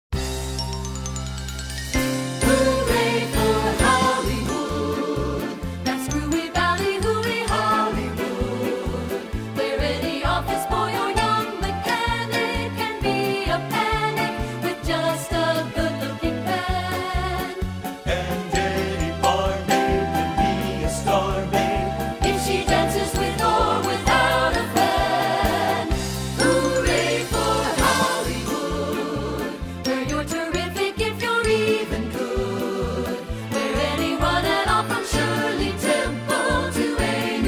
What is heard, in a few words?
satb